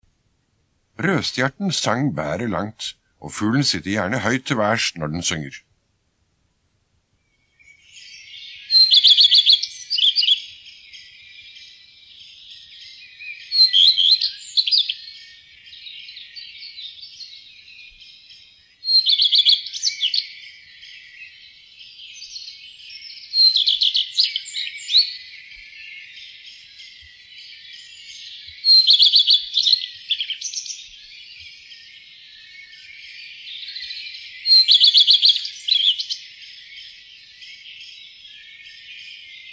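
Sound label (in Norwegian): rødstjert, fuglelyder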